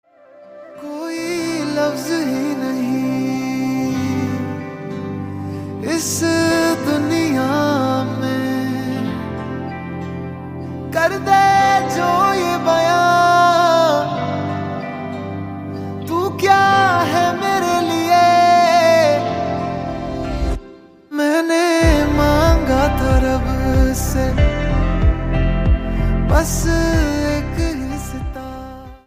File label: ringtone